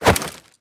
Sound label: melee attack gun; viper